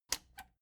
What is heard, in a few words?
plastic button latch squeak click enable slow